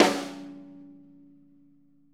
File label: snare rim, Interactive Drum Kits; Sonic Reality